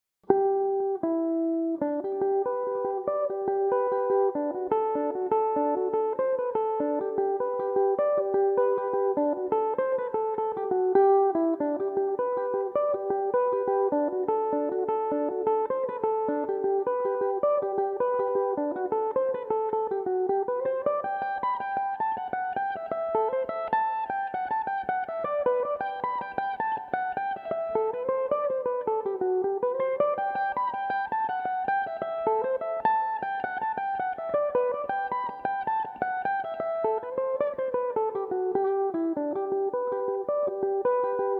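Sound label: G major, irish mandolin, tunes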